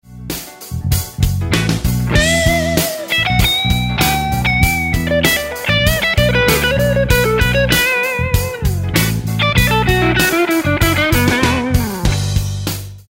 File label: Funky Lick, guitar